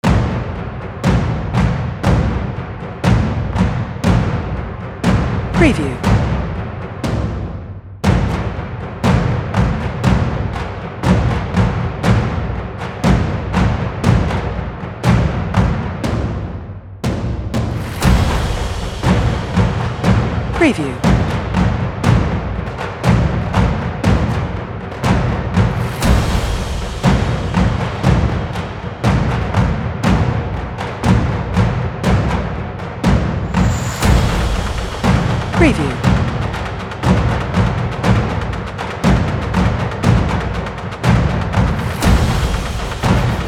Big Marching Drums Action Cinematic Trailer Polyrhythmic Massive